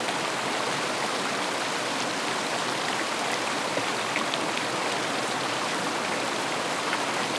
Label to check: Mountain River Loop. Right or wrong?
Right